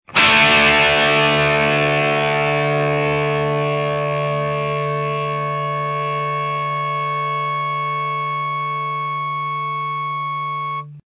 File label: guitar